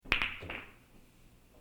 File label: pool